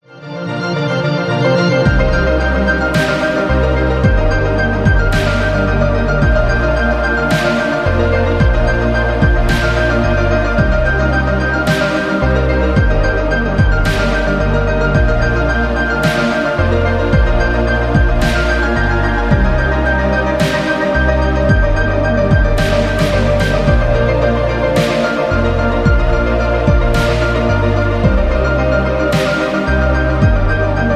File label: Tamil Melody Ringtone